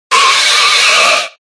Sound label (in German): Ruf